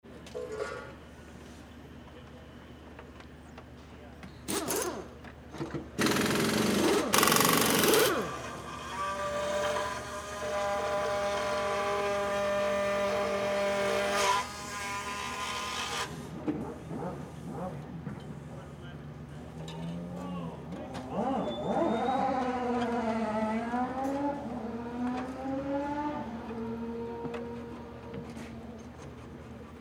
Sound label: cart racing pit team